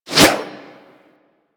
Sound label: Axe Small Swing; Warframe; Weapons